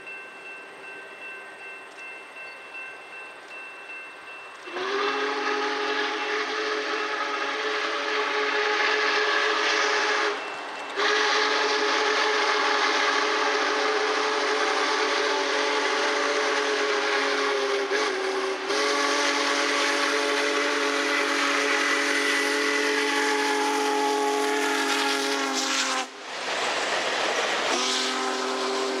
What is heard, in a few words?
whistle